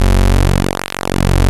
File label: PULSE MOD